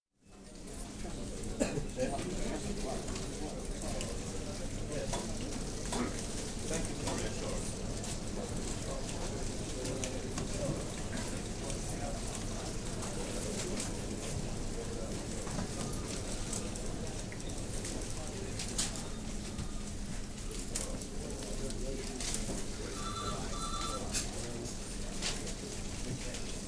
National Newspaper Newsroom atmosphere, with voices, typewriters & telephones; Recorded at The Times; BBC